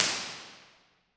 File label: ENTRY HALL, Piano Reverbs; Convolution; IR Samples; Kontakt 5